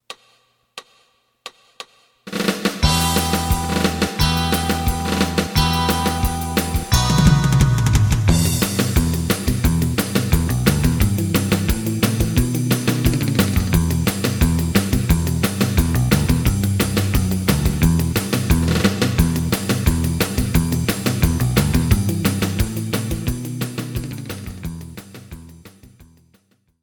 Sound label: lead